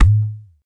DEEP WD, DRM HITS; Spectrasonics; Liquid Grooves; WAVDRM HITS1

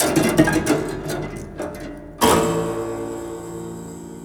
Prepared Piano; Battery 3 Sample Kits

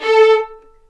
A4 VLNS ARCO DB; East West; The Ultimate String Collection

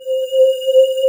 C3 MULTI, CRYSTAL SYN; AKAI S6000 CD-ROM - Volume 1; SYNTHESIZER

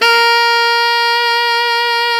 sax alto ff; Roland LCDP07 Super Sax

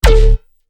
BASS ONE SHOT ALARM

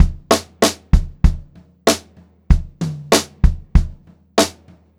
Pop-Rock Drumloops; 96 POP 3

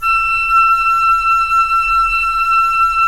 FLT FL, Flute; Roland - LA Composer vol. 4

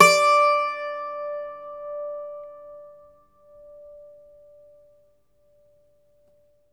IRISH, Old World Instruments

